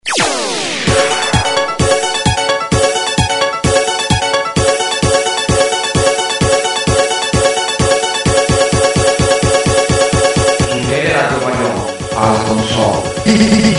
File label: jingle